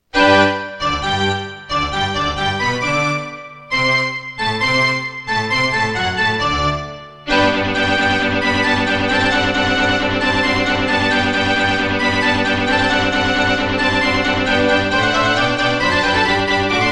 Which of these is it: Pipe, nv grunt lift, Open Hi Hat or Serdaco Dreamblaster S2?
Serdaco Dreamblaster S2